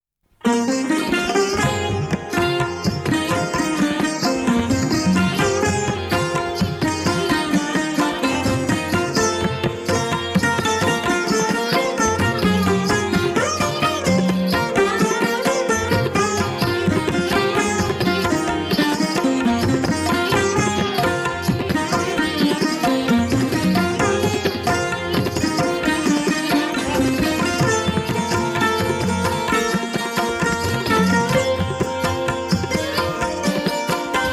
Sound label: Lehra (Instrumental)